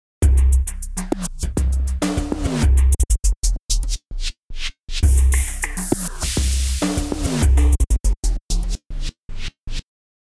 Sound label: ending; sugar bytes; effectrix